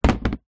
plastic tub box large place down on concrete ground; foley